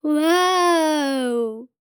EMOTE Child, Trust Admiration

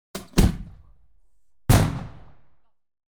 Fireworks, Ground Mine, Thump; BBC Sound Effects Library; Explosions Guns Alarms

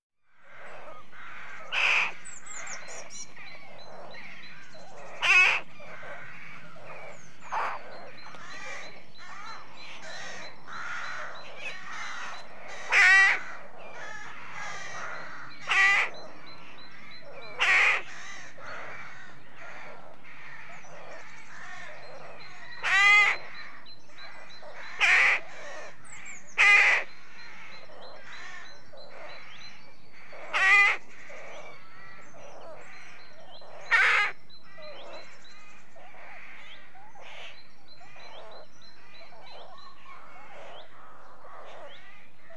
Rookery, Nests Of Rookery, With; Birds; BBC Sound Effects Library

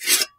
plates Slide; Kenney's Sound Pack; Foley Sounds; Plating

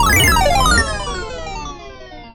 NEGATIVE Failure Descending Chime; GameBurp; 2000 Game SFX

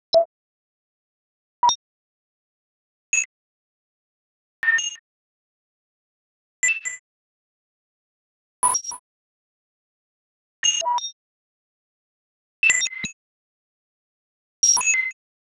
Single Tones Bleep; Digital Sounds; soniKSound; HUD, Beeps, Devices

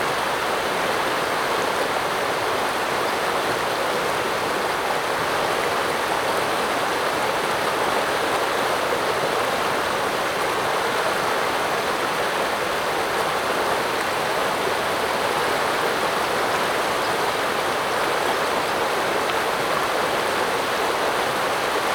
river alpine; Hydrology Streams, Rivers And Lakes

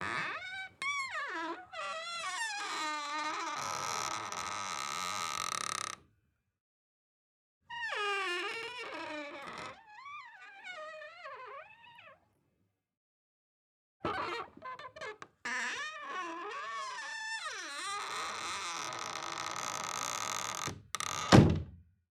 Door Hinge Creaky x3; Soundopolis; Household Doors HD

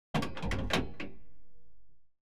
BT Payphones, Dial, Receiver; Computers Printers Phones; BBC Sound Effects Library

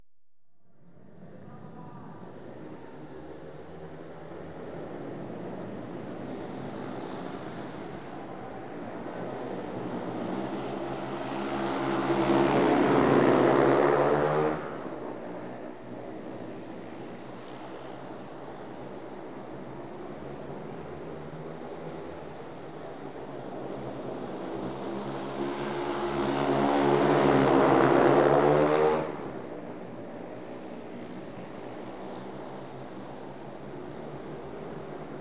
Race Recorded From Inside; On Oppo; BBC Sound Effects Library; Adventure Sports

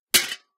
plates hit; Kenney's Sound Pack; Foley Sounds; Plating

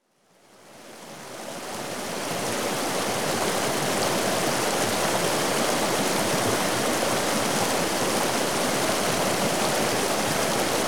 Rivers And Streams, River; Rivers Streams Water; BBC Sound Effects Library

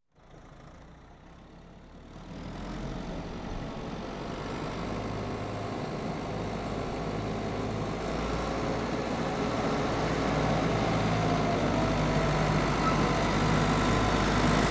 Tractor Ford 7610 Tractor With; Farm Machinery; BBC Sound Effects Library

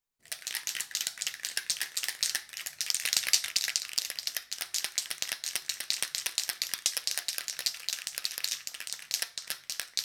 Can Of Spray Paint Shaken; Construction; BBC Sound Effects Library

